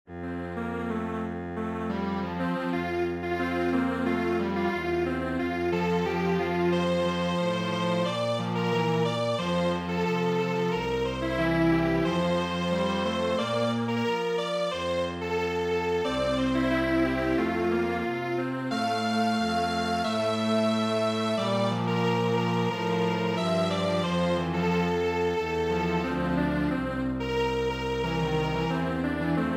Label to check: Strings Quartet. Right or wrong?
Right